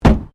Car Ford Focus Boot Close; Ford Focus hire car; Cars; Vehicles

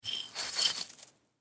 Snow Bottle Pick Up; Ice; Weather; Ice Sound Effects; Blastwave

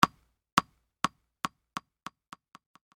Sports Tennis Ball Drop Bounce

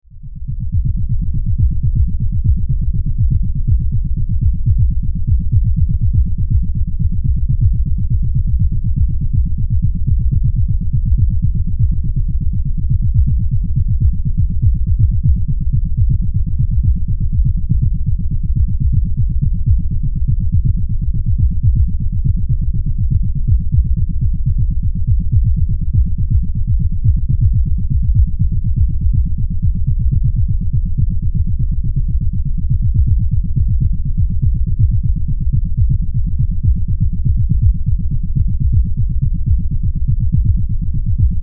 Bed Rhythmic Bass Loop; Bed Sound Effects

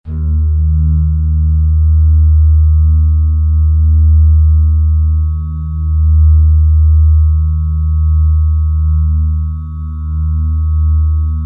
Spaceship, Spaceship: Ambience; Spacecrafts

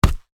Sparring Glove, Impact, Hit, Punch; Sports; Boxing; BlastWave

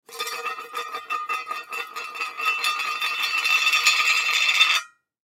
Tin Small Lid Spin, Tin Lid Spin Wobble; Foley; Lid Sound Effects; Objects; Blastwave

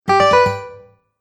Game Bonus Ascending Strum; Video Game Sound Effects

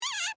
Door Squeak Wood, Foley, Door, Squeak, Wood; Screen Door Sound Effects; BlastWave

